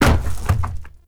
Forest Tree Fall; Warframe; Awakening Remaster

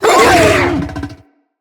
Infested Juggernaut Vocal Pain; Vocals

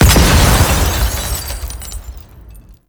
Bridge Window Glass Break; Ambience; Gameplay; Warframe; Corpus Railjack; Comet Shredder

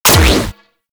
Fire; Warframe; Weapons; Acrid

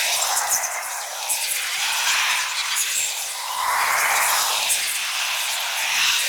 Wisp Light Loop; Warframe

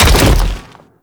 Land Default, Foley; Warframe; Sentients; Erra

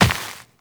Step Dirt, Footsteps, Walk; Warframe; Characters; Tenno

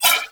Nunchaku Prime Whoosh; Weapons; Warframe; Tenno

